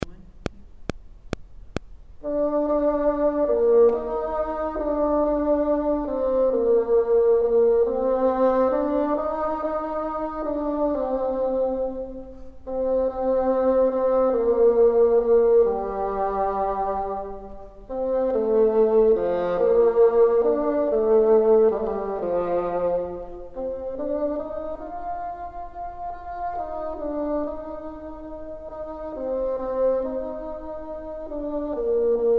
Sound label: top, bassoon